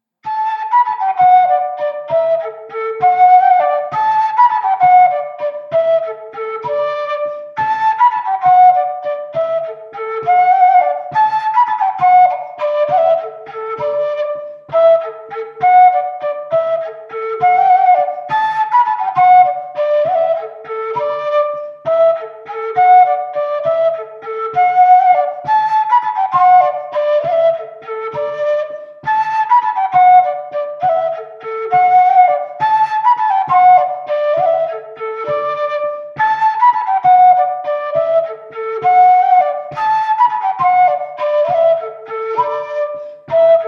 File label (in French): Tyrolienne